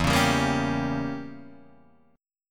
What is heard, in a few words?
E Diminished 7th E down Guitar Open D